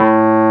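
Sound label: WURLY NO, Wurlitzer electric piano; Vintage; Sonic Reality; Vintage Timetraveler; WURLITZ EP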